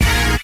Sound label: aww